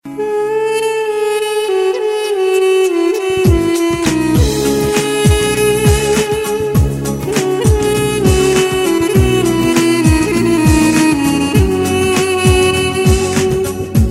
very sad melody

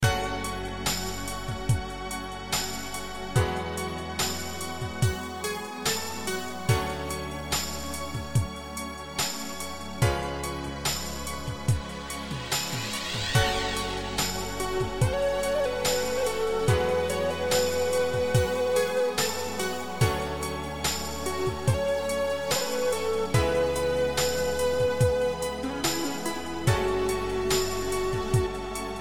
keygen music

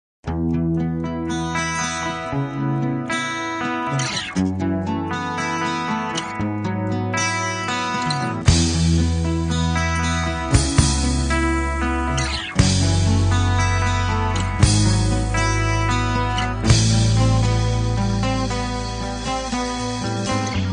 cool guitar

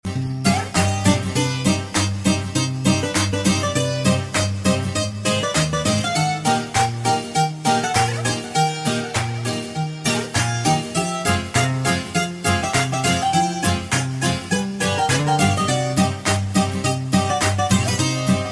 greek bouzouki

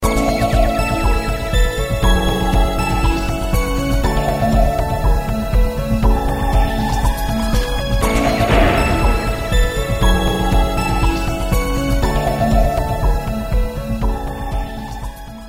romantic

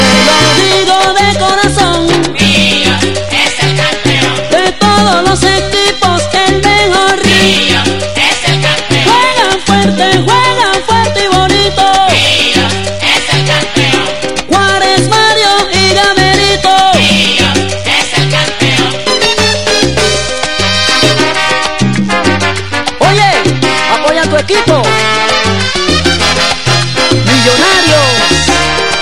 salsa

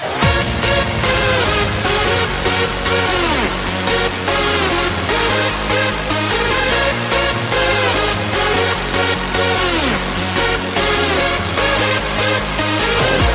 dance tone

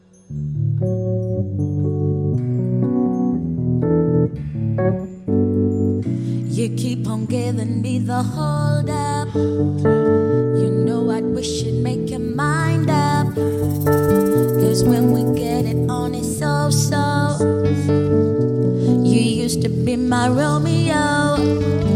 silence music

